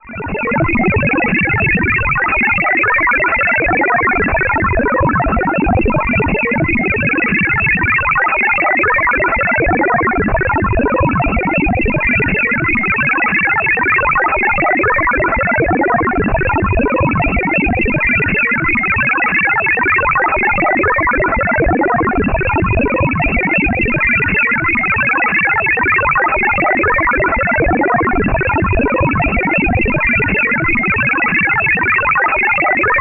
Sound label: Scrambled tfc 18379 kHz; Perseus; Schwarzsee